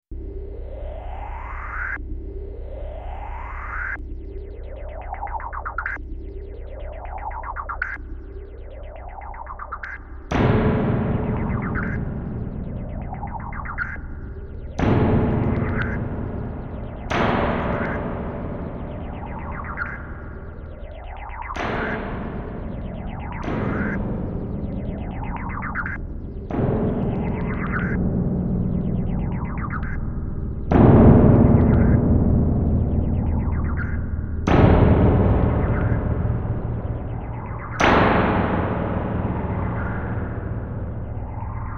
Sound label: retro crash tone